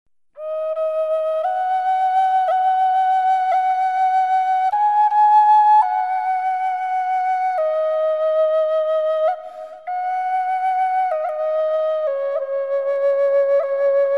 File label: sample, indian flute